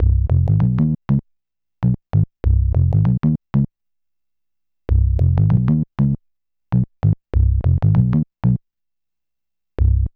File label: BASS LP